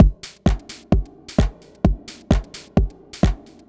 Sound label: Beat (130BPM), techno; techno drum fx